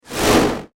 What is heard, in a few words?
Whoosh Distortion Fuzz; Production Element; Whooshes; Sound Design